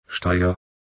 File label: steyr german; Austria